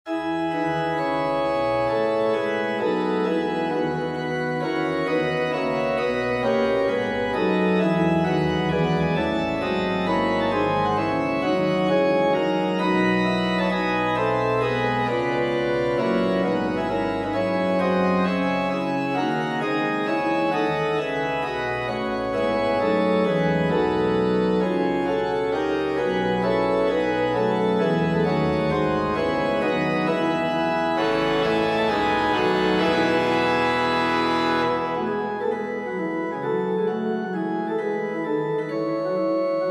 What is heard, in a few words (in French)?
grand choeur, organ